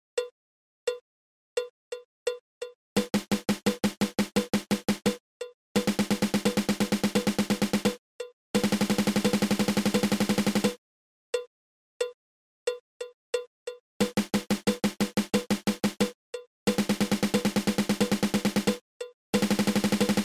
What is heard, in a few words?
Single Stroke Roll